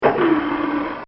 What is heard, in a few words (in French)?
AMARRE